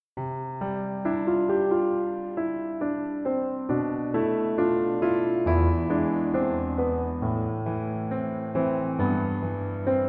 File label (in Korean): Piano ver 멜로디MR